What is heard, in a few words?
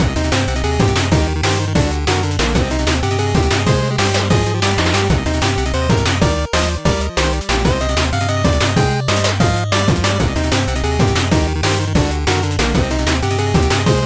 boss fight cycle mono; Sigi A Fart for Melusina